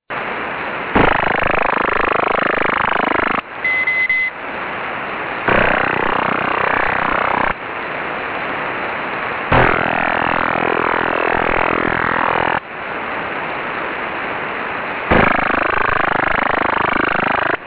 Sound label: ROTHR 8130kHz